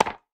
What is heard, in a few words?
Racket Weapon